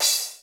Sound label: DRY CY SPLSH, drum; Crypton; DrumBase5000